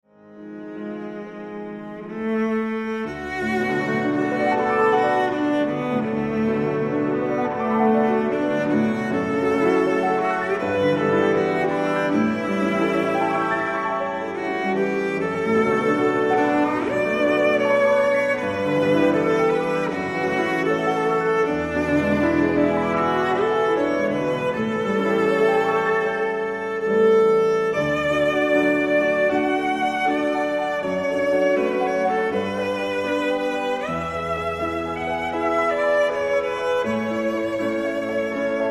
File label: melody